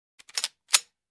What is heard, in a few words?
Gun trigger sound